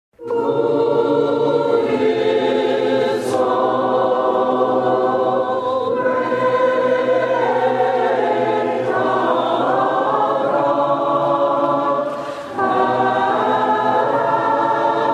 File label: combined choir